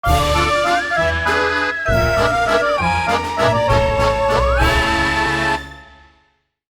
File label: Opening Jingle; Horror Land